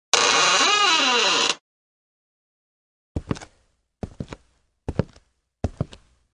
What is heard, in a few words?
door creaks open footsteps